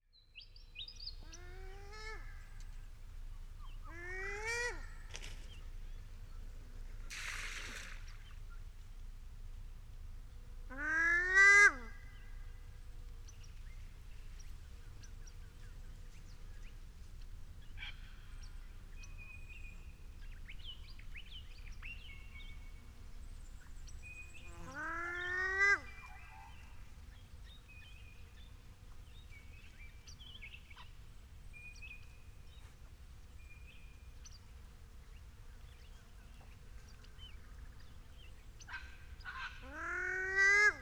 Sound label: BIRD WOOD DUCK